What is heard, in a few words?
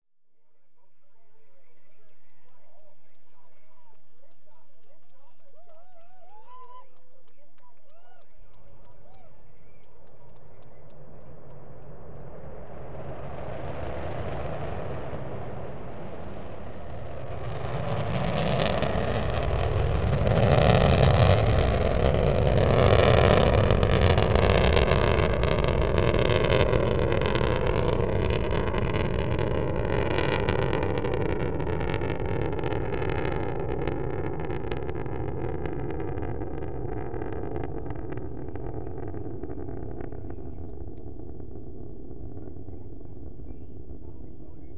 Launch Sound Delta-2 Launch